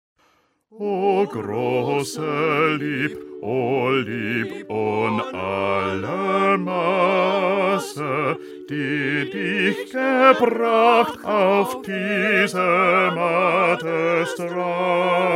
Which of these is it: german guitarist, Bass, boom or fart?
Bass